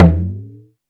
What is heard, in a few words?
EASTERN, PERCUSSION